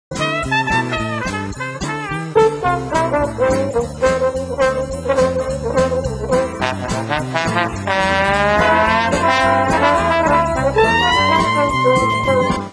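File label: soprano trombone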